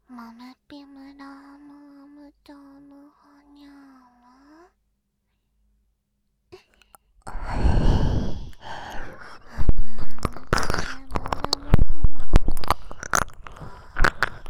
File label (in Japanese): 音圧耳舐め, くちゅ音SE無し; 宇宙語版; 宇宙人による音圧耳舐めと赤ちゃん言葉セックス; 音圧ゾリゾリ耳舐め